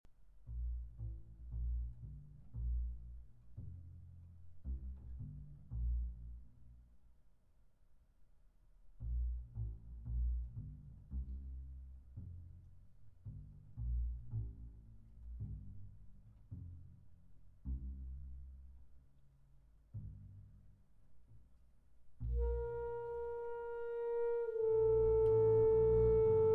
Alto Saxophone Concerto in B-Flat Major Air - Hornpipe